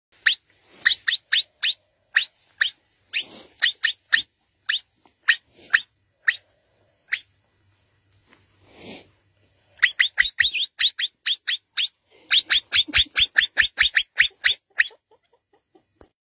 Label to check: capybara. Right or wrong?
Right